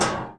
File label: shield hit; soundspvz